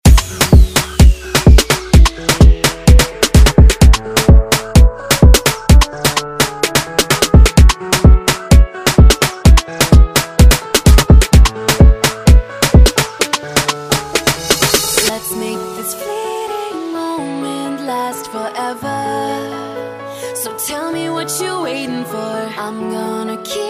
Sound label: Afrobeat Redrum